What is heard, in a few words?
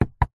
car radio button press interior nissan patrol; vehicles